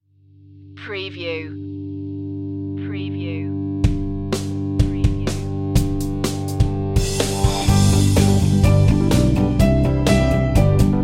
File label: the alphabet song instr